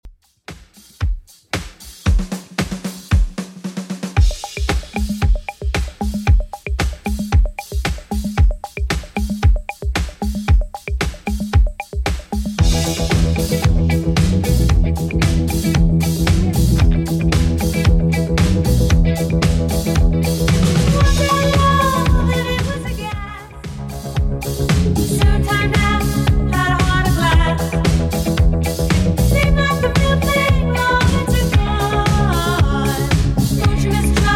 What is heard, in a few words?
70s Redrum 114bpm CLEAN